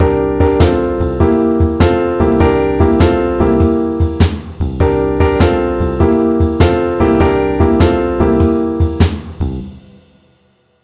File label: Blues comping